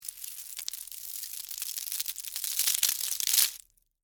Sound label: Texture, Debris, Spill, Sugar, Cone, Waffle; Debris Sound Effects; Blast Wave